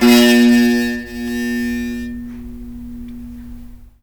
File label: JAKAY B3; Spectrasonics; Heart of Asia